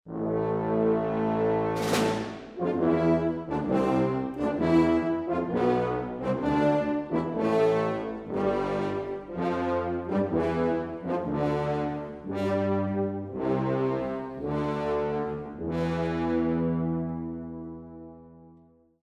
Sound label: Blast of Brass